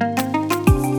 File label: Lil Plucks; Solos; BB 2HTC Samples Vol 4 Addendum